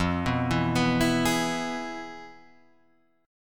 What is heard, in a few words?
F Minor Major 7th Flat 5th Guitar Drop D